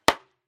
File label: archery foley arrow impact wood; SoundsCrate